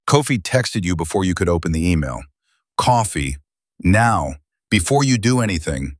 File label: briefing beat; lowball offer